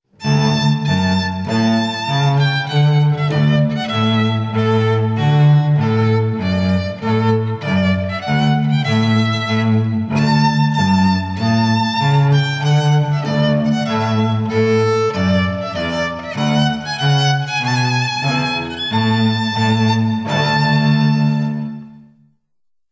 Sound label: Trumpet